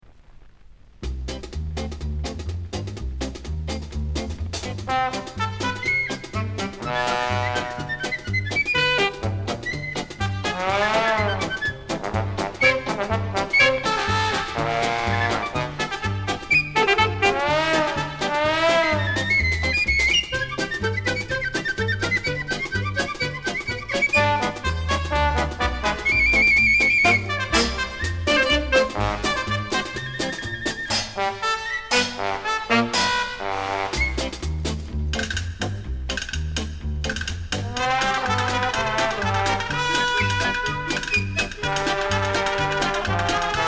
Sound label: danse orchestra